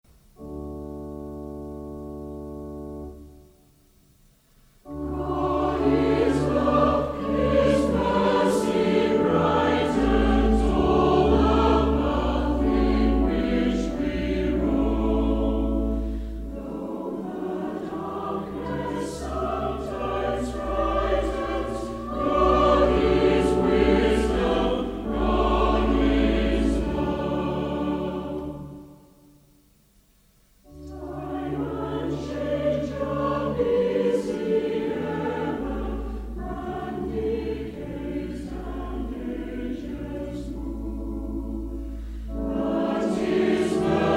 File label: Choir, hymns